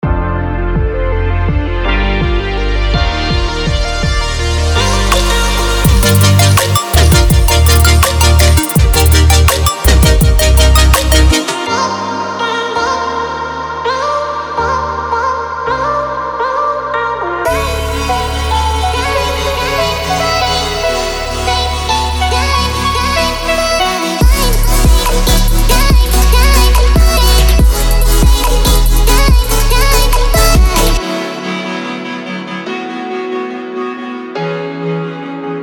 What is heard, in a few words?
Lush Future Bass